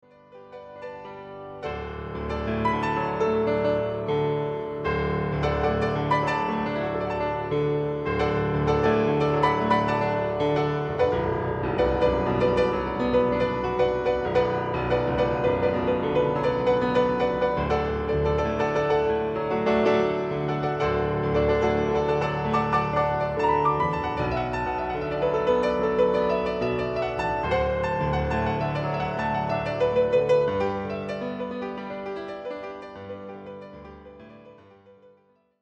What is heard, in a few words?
Fanfare